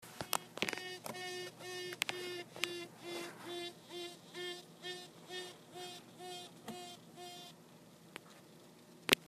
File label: Piping Queen